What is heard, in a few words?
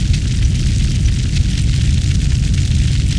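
fire loop, disasters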